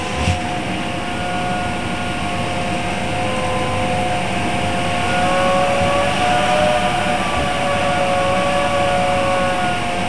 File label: wind